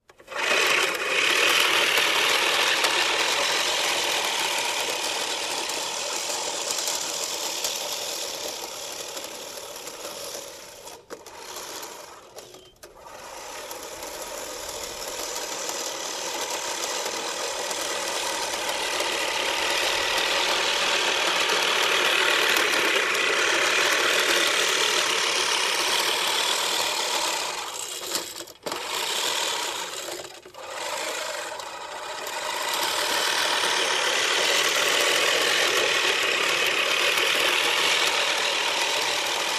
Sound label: Cutting Hedge